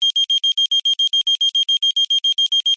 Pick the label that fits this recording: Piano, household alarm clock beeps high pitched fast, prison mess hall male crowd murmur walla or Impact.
household alarm clock beeps high pitched fast